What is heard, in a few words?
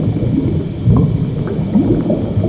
gas; zs culvert